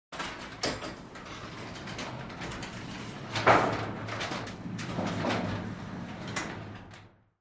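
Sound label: garage door small open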